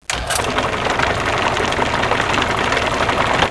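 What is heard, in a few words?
paint mix, construction; KABOOM! - Wild and Wacky Sound Software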